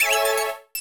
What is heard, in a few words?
PLAY TRAMPOLIN